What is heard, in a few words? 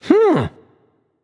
happy; smite; voicepack; osiris